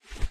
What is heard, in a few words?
Grab; Five Nights at Freddy's Final Hours 2